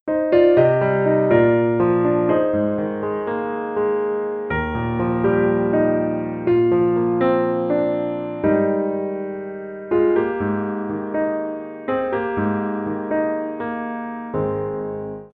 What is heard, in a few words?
Ringtone